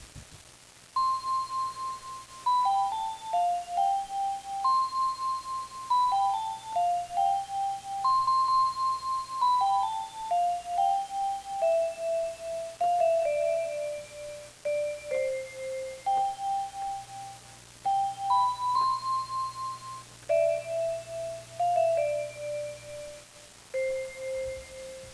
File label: bionicle music